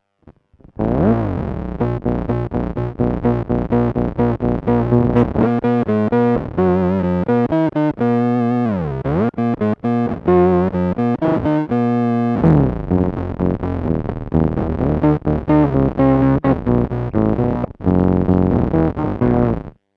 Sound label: funky